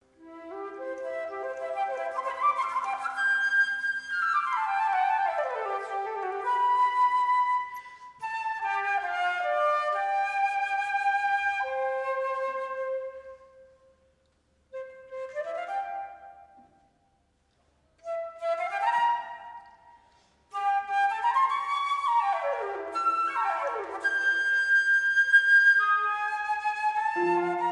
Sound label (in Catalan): piano i flauta; als classics; l escala empuries